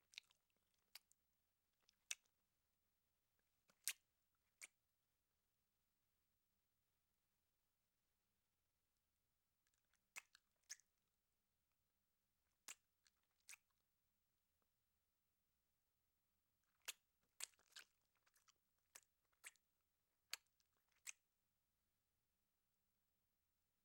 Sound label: Lots of Kissing; Fairlight Sound Lib